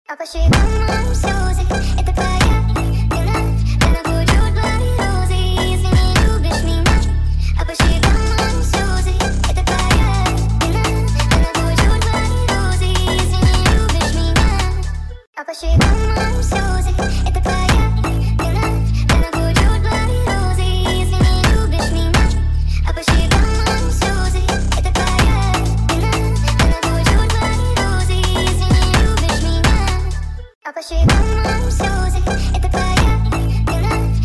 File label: Brazil phonk